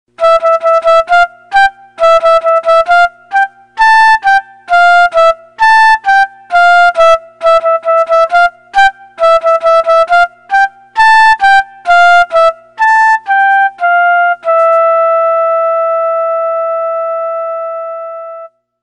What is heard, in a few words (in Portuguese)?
Flauta